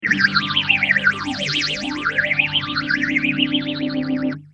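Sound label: guitar Mutron biphase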